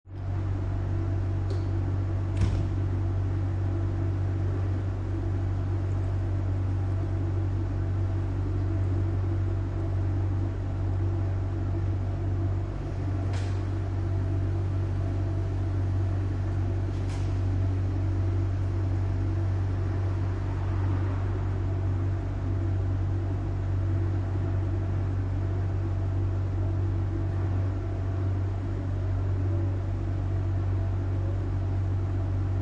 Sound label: ambience inner courtyard 5 storey house city centre poland factory hum nearby scoot; factory hum nearby scooter passing